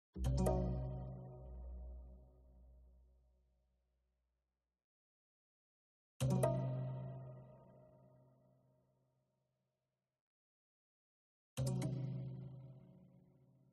MUSICAL HORROR Haunted Strings Chord Diminished Damped; smartsound